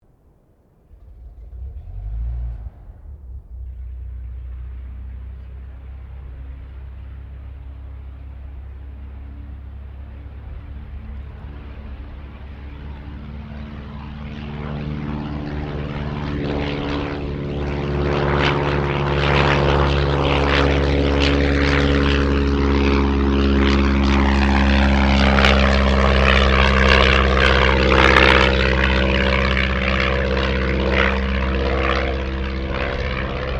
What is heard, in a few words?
Aircraft Dehavilland Tigermoth Engine Recovery Pass Long, RAAF Tigermoth biplane; RAAF Tigermoth biplane training aircraft